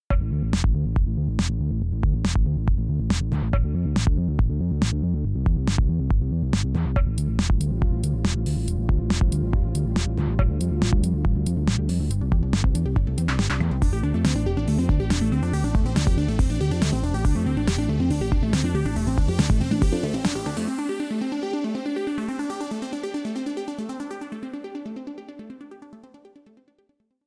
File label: example music 140bpm